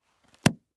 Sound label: Book Large Close; Book Close From Middle; Book Sound Effects; Offices; Foley; Blastwave